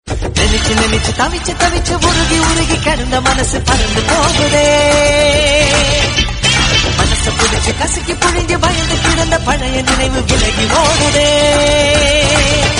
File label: tamil old love song